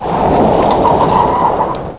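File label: SPIN ROCK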